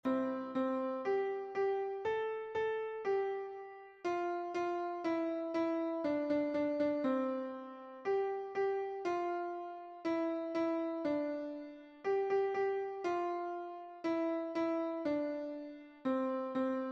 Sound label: The Alphabet Song Piano